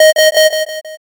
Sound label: alarm